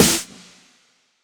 Snares; Vengeance - Essential Clubsounds Vol.4

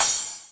Akai XR-20, Drum Machines, Cymbals

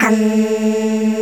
UMM A2, POP CH

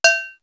ANVIL; East West; Global Sounds; D4 FXS

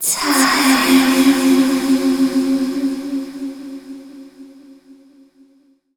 VOICE FX, Dance Vocals

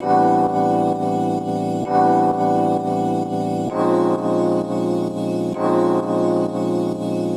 Epian Loop 130; Music-Fx Loops 130; Equipped Music; Loungin House

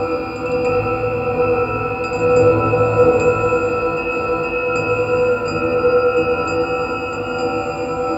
Gong Shimmer; Sonic Foundry (Sony Creative Software); Ma Ja Le Ethereal Textures

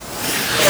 sfx sci fi; Masterbits - Switch FX

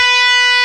GUITAR, POWER GT

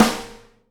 SNARE, Live Snares

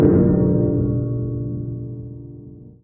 klang bass a; Sonic Foundry (Sony Creative Software); Ugly Remnants VOL-2; Basstuff

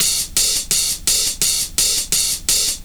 CYMB, Breakbeats; USB Soundscan vol.46 - 70 s Breakbeats; AKAI

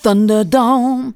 vox, Techno Trance; Ueberschall

